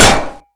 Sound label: hit, crossbow